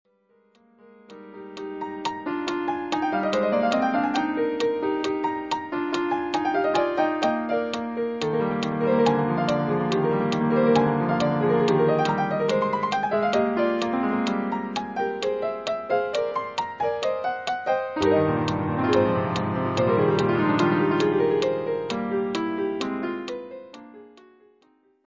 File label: midi classical